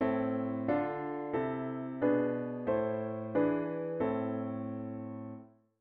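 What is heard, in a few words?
diatonic inverted V7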